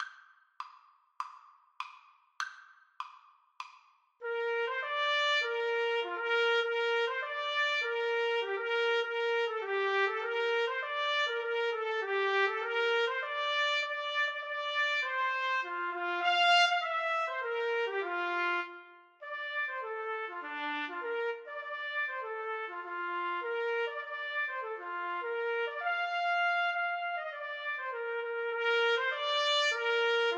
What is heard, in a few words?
trumpet duet